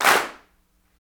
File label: CLAPS, Africa Handclaps; Roland LCDP14 Africa VOL-2; Afr. Hands